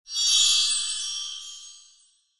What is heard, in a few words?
reveal Area